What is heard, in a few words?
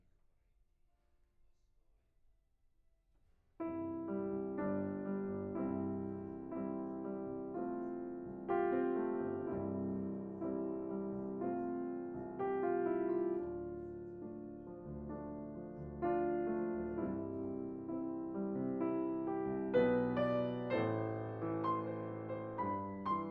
PIANO